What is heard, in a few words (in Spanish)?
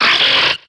Grito de Sableye